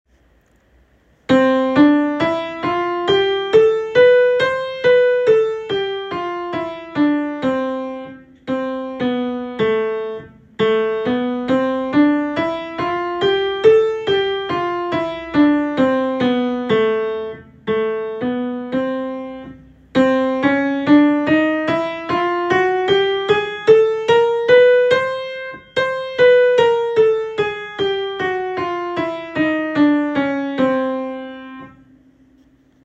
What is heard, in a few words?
All Scales Starting C; all-state-chorus